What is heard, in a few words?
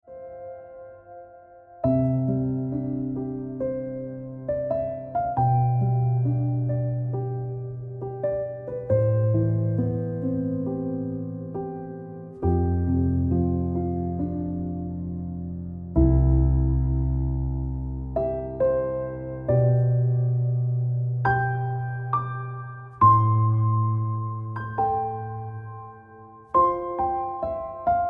Ringtone Piano Music